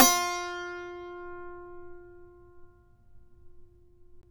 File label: saz F14; Roland - LA Composer vol. 3